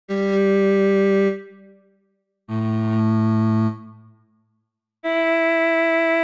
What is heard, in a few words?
G4 A3 E5 violin